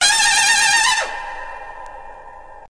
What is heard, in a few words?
stretchy trumpet blast; Horns and Brass